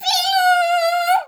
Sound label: ping